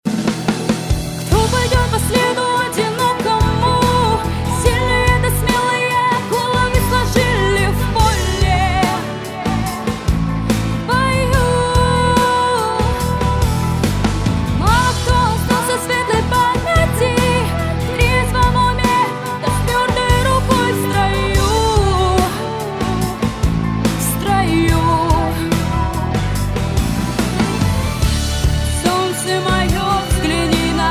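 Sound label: vocal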